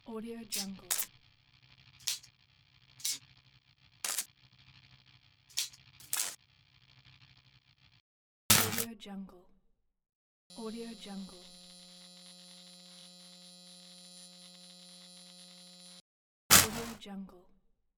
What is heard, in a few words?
scifi electricity sfx pack